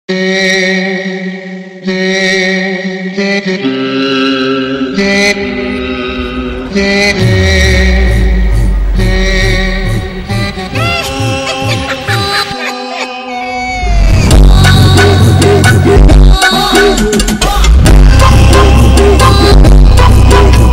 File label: Brazilian Phonk